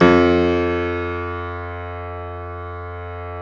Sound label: Piano 2 F 2; Proteus 2000; Digital Sound Factory Proteus 2000